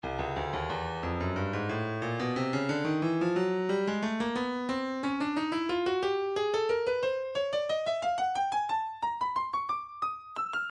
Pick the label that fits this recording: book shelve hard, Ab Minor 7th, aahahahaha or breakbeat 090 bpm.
breakbeat 090 bpm